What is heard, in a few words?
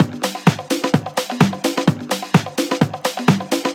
Percussive Loops 128BPM; Vengeance Samples